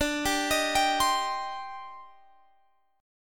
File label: D sharp Major 13th, D, guitar chord